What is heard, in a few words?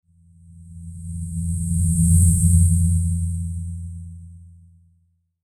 WHOOSH SIZZLE, Whoosh Sizzle High Pitch Rumble Low; Blastwave; Whoosh Sound Effects